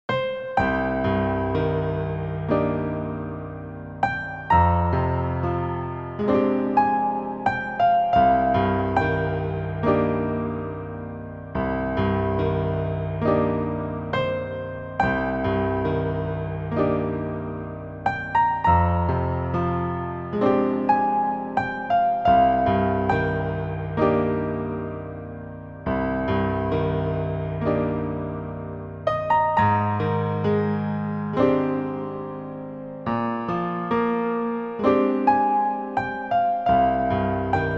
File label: piano